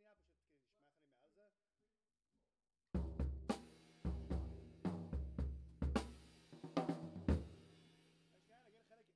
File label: KIT